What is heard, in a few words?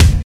sharp bass drum sound g sharp key; kick drum samples